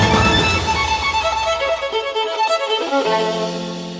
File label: theme violin cover; zelda skyward sword